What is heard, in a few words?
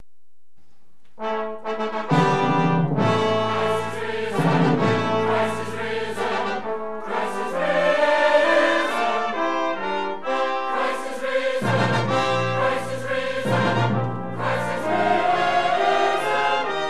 Easter Fanfare